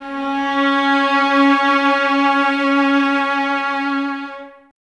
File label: violas C#4, String Ensembles; Miroslav Vitous; VLS 1+2